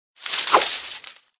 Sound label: forest spirit attack voice up